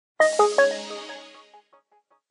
Power Off, LG GM730; LG GM730 Eigen

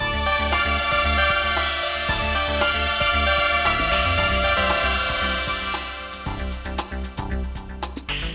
call ringtone; Nokia; 770 Internet Tablet

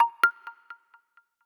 SMS, notifications; Infinix XUI SMS; Infinix Smart 2 HD

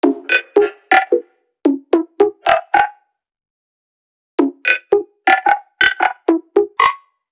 beetle, ringtones; Nothing Phone (2)

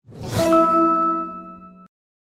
Bootsound; ZTE Max XL N9560; sprint

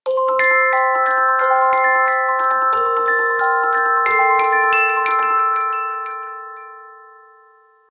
Ripple, ringtones; OnePlus 12

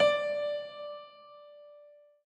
dial tone piano

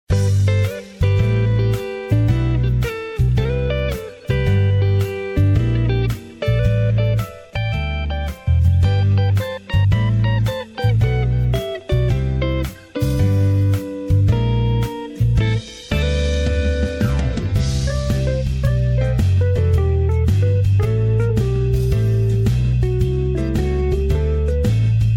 Surf the Groove, Ring tones; LG GD910

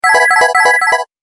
Serene tone; Samsung GT-i8510 INNOV8

